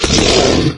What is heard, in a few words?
evade right, wraith; doom3